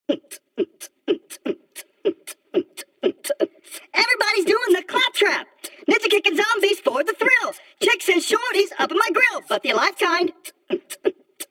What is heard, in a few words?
rap